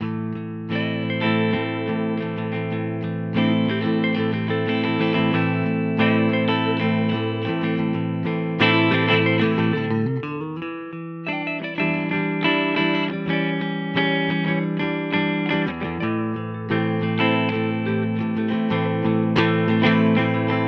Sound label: Harmony Guitars both Pickup clean bright tone